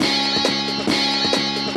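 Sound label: Guitar Note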